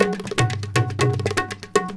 India, Heart of Asia

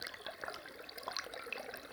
Waterway E Light

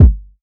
Kick Body

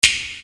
Percussion, EuroDance

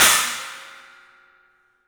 CYMBALS; 3D Sound; 3D Drums

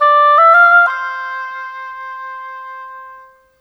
Oboe, Orchestral, Rock Pop; Sonic Foundry (Sony Creative Software); Orchestral Series VOL-4 - Rock and Pop

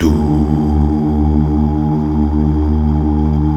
Mal Duh; Best Service Gigapack 1

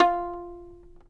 fiddle pizz F4 R; Sampleheads; Whole Lotta Country 1 & 2

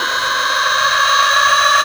DANCE FX

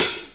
Akai XR10 Hat Mid; Drums

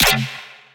BASS SHOT F

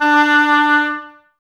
OBOES D, Oboes, Wood Winds; Miroslav Vitous; Woodwind Brass Ensemble

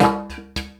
Didgeridoo Kit Samples

